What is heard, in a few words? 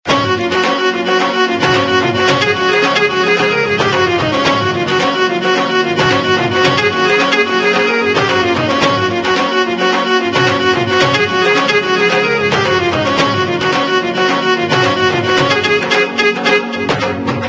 Instrumental